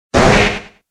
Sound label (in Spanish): Grito; Slowpoke de Galar